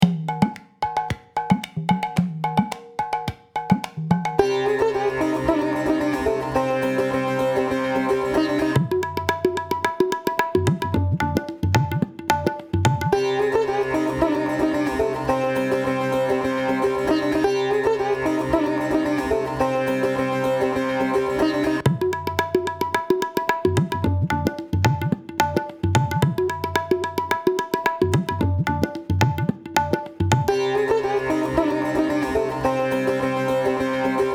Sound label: Indian Classical Music, Raga Malika